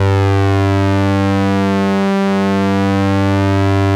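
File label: ARP lead G1; Sonic Reality; Vintage Timetraveler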